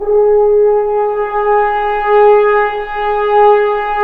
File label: BRS F.HRNS, FHns Swells; Roland L-CDX-03 Disk 2